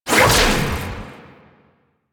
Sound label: Archon Whip Whoosh; Warframe; The New War; Nira